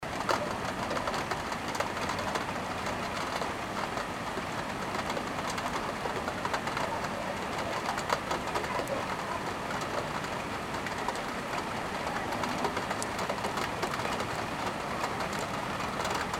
zvuk dozhdja

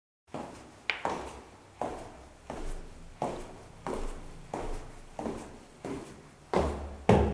zvuk shagov

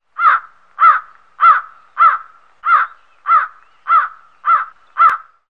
zvuki voronov i voron

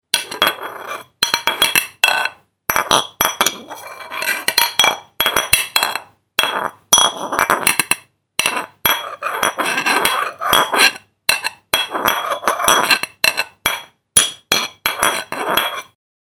zvuk tarelok

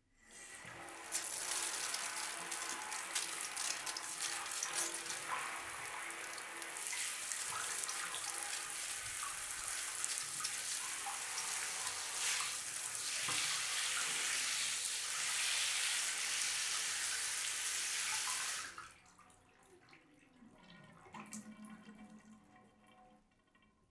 zvuk dusha

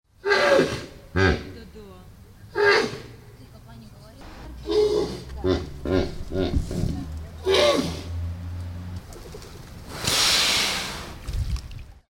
zvuki begemota

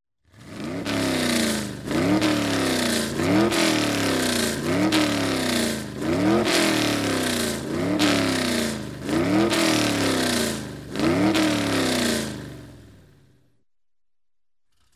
zvuki avtogonok

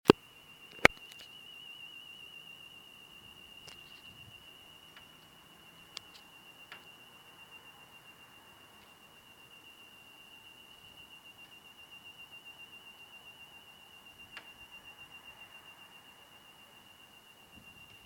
zvuki inoplanetjan

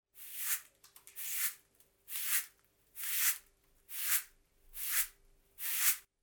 zvuk metly

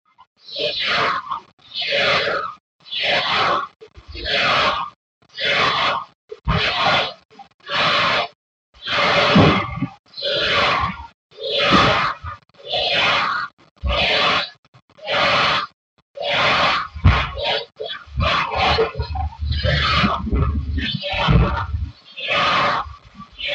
zhutkie zvuki

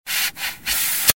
zvuki shinomontazha

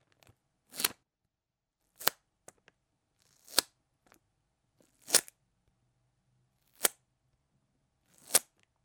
prilipanie

